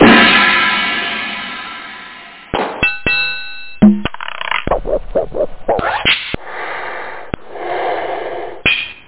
ash cym, drum kits; ML Sonic Drum Kit; Drum Kit 1

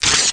tear sfx; Listings Deluxe - Select Street Atlas 1.0

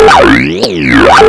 WIERD SO

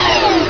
exit menu; PC Gamer IT CD 41; Daikatana

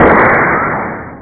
Alien Fires; Offender; Poor Sounds

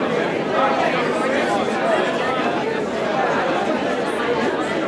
Ambi, indoor; Atennis

